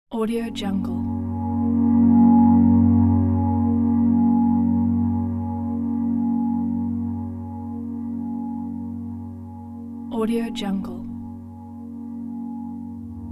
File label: calm meditation background soundscape relax